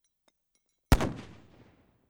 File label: flashbang explosion